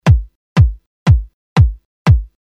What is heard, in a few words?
Solid state bass drum